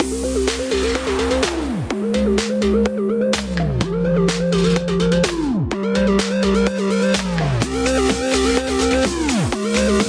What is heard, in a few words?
Jet Race Music; pixelworlds